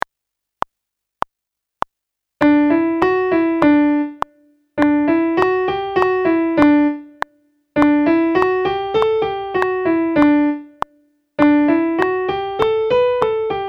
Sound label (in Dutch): Toonladder D oefening piano